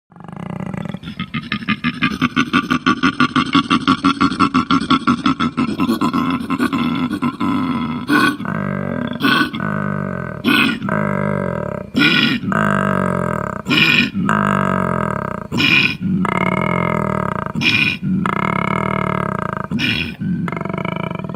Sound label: koala